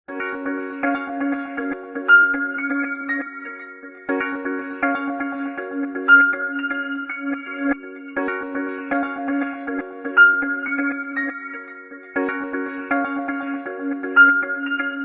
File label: Ringtone; Motorola Moto G22